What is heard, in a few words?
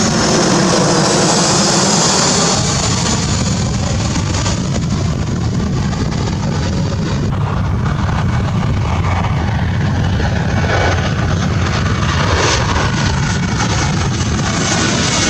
Airplane Landing Sound